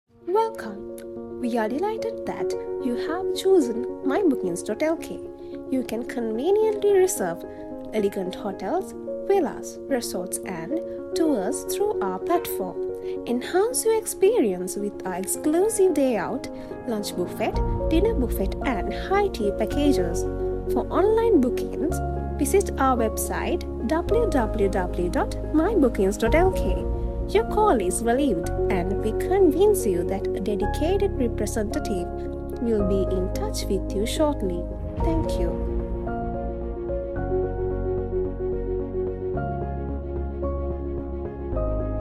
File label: Ringing tone